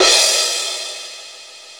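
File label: mallet cymbal; East West; Global Sounds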